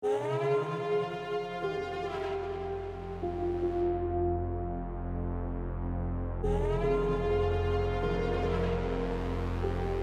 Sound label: Trailer Score